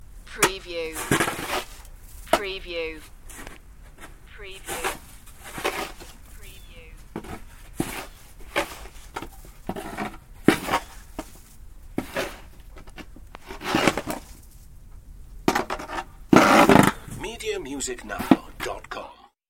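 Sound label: Shoveling Snow Sidewalk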